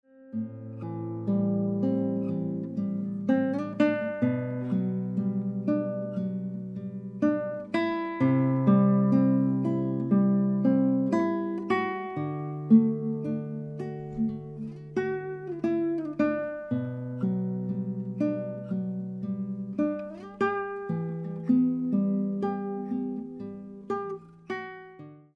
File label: Mystical Meditation